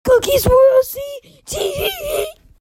teeheheheeh

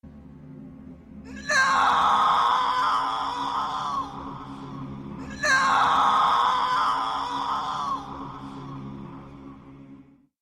nooo; frodo

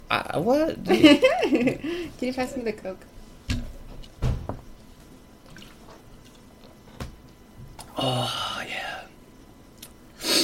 snorting

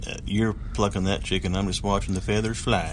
plucking

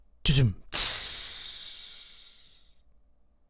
bad joke

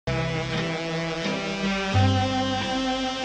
trompet disgust

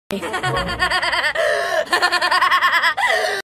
funny laughing

